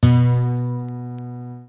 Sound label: lyra; theodyssey